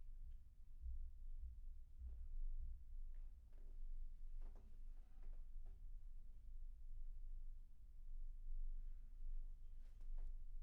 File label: Bass Room